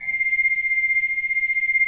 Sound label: C6, Cathedral Organ; ILIO; Propeller Islands Cathedral Organ